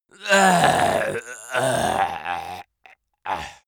male die; soundpool

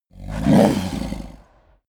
fishman snort exhale; kreaturen

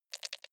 click erratic wide

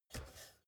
step ladder metal grip single

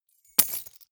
key ring large drop carpet hard

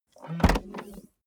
car bmw e46 exterior trunk open short

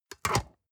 storage lid slide close medium, box; objekte

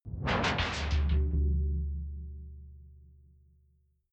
sci-fi alien tech big; swooshes-swishes

